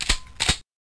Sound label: shotgun deploy; duke